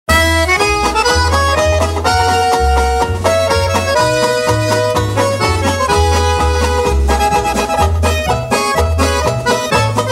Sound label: Hayride Polka, accordions